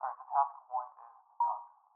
isolated beep

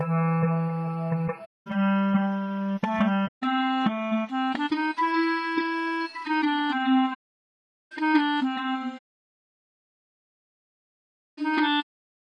clarinet

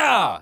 pizza hit; roblox

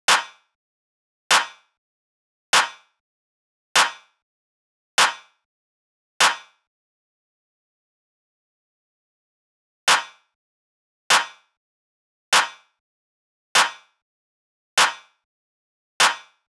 broken clap

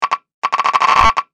Button

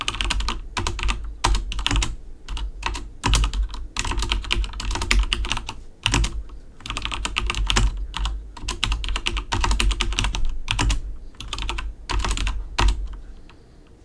typing

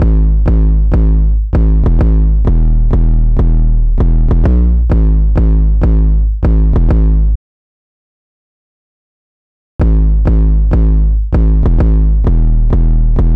gay 808; clipped; peroxide peak

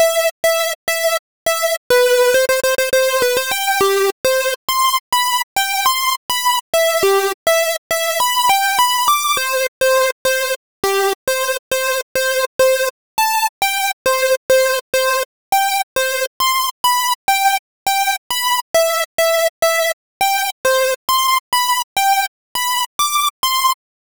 Serum Super Saw + 3x Square

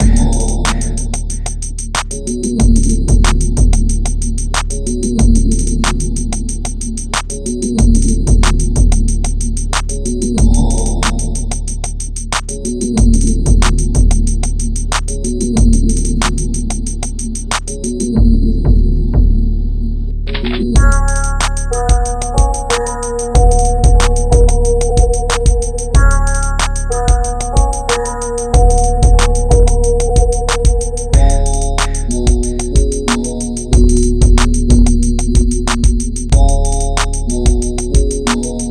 midi; Roblox Doom; area 51

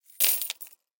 Coins Drop; SFX Producer

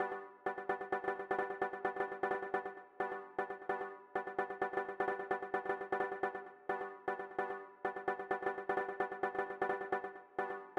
synth High; fl soundfont player

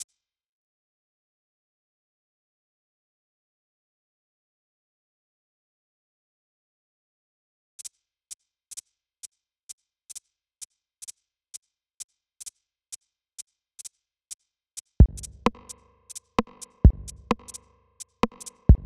soundfont player percussion; fl soundfont player

